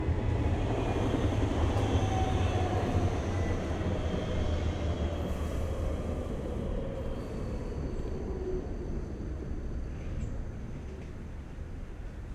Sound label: Berlin Balcony Traffic Street Afternoon Sunny; 3PM; TLM 103; ShapingWaves